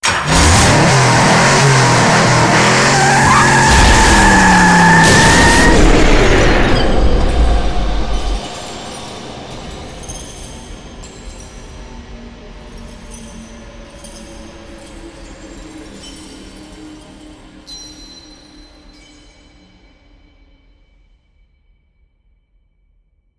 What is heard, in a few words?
racecar exit